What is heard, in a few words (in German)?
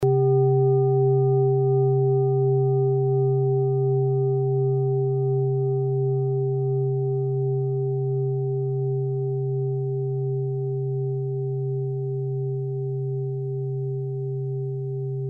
klangschale ladakh